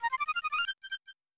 The Fife; game ost; breath of fire

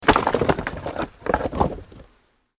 Rock

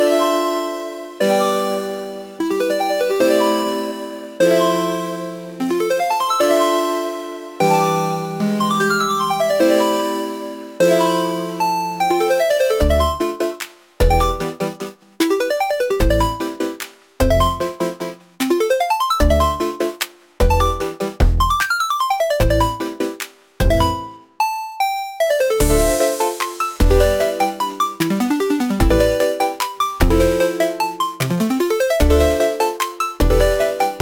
tune, chiptune

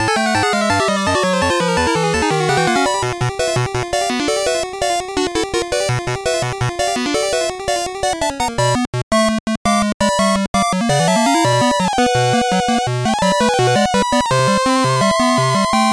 Battle vs Trainer; Kanto

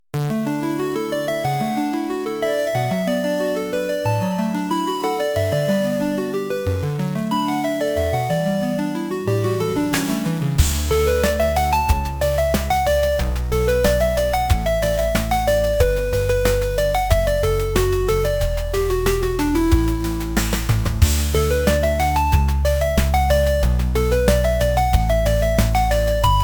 tune, chiptune

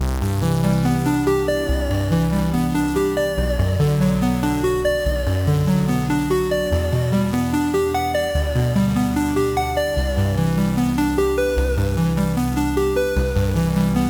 tune, chiptune

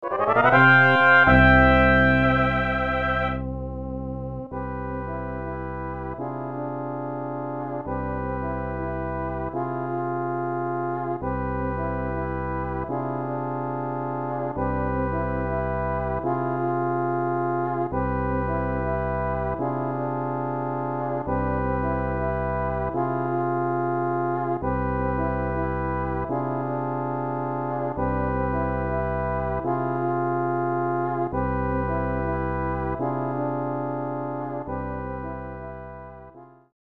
You Won; EarthBound

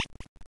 CHIRP

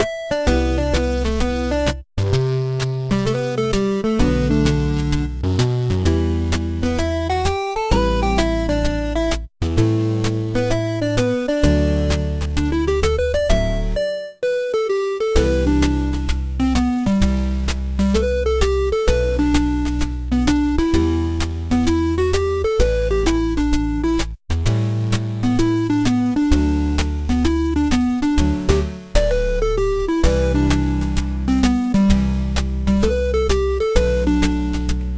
chiptune

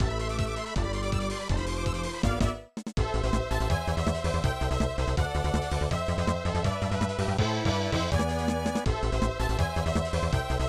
Battling; game ost; breath of fire

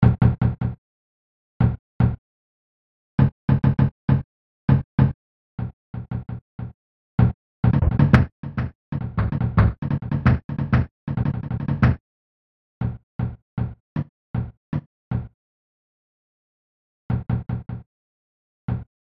Another Annoying Knock; EarthBound

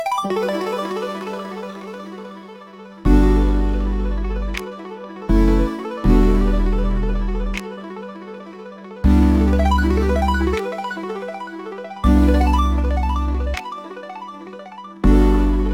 tune, chiptune

